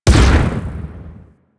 Swarm Missile Explosion; DE; Warframe; Weapons; Sentinel; Helstrum

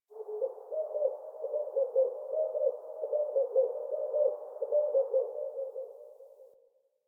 Birds Medium, Ambience, Plains; One Offs; Warframe; Eidolon Plains; Eidolon Landscape

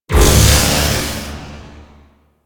Beam; Enemies; Betrayal Beam; DE; Warframe; Warframes; Pax Duviricus

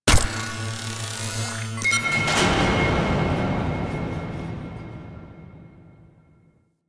Railjack Command Hack Start; Warframe

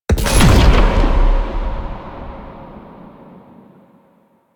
Hazard Fracture Disable; Warframe; Duviri; Undercroft Hazards

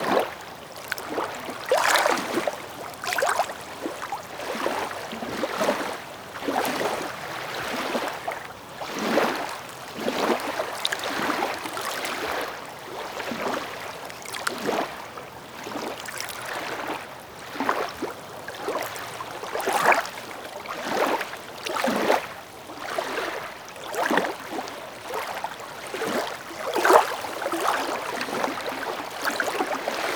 Calm Ocean Water Laps

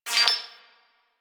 Tech One Off, Ambience; Warframe; Rail Jack

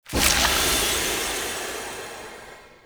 Root Cave Magic Spore Puff Large; Ambience; Props; Neathuns Root Cave

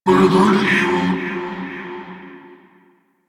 Rally Cast Vocal; Warframe; Warframes; Hoplite

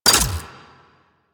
Flak Cannon Mech; Railjack

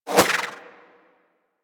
Throwing Star Whizzby; Warframe; Weapons

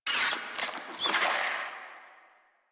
Infested Ashen Vocal Attack; Warframe

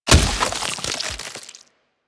Tree Seed Splat; Ambience; Warframe; Infested Eris

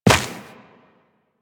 Run Dirt, Footsteps; Warframe; Tenno

